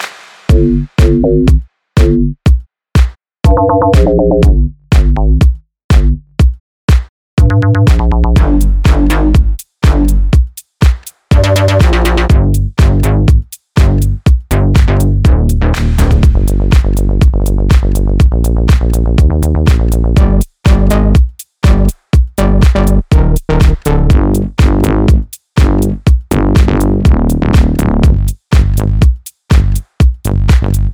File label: pluck bass